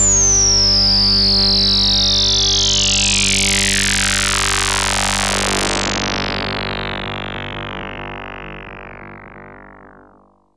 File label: SWEEP G2, SYNTH FX; Trance; AMG - Global Trance Mission VOL-2